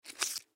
Toothpick Dispenser, Toothpick Dispenser Pull Single Out; Restaurant; Bathroom; Foley; Blastwave